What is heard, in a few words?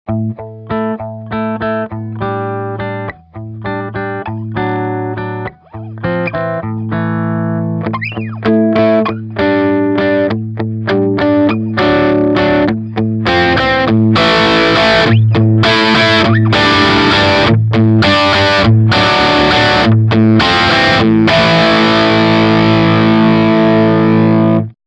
PRS FLOYD CUSTOM 24 Dyna Pick; PRS FLOYD CUSTOM 24 Ten Top